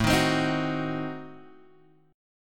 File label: A Diminished 7th A down Guitar Open F; Adim7 strummed; JGuitar